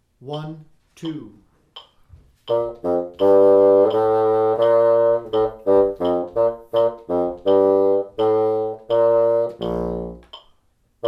German Bassoon 3 84; Rubank Elementary

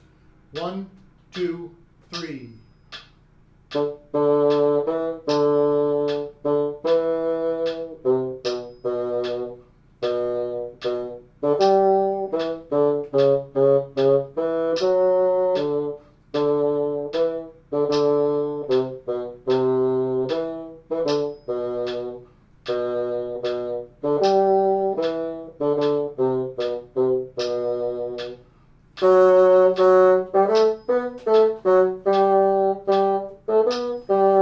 Carry Bassoon 1 76; Rubank Elementary

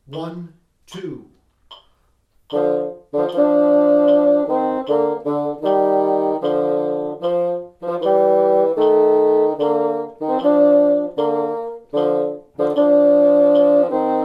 Polish Bassoon 1 and 3 76; Rubank Elementary

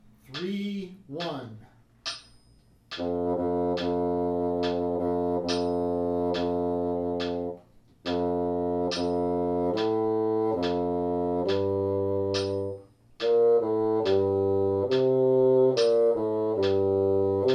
Lullaby Bassoon 3 70; Part 3; Rubank Elementary

